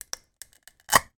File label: industrial varnish or paint small tin open; sound effects one